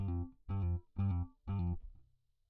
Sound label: bass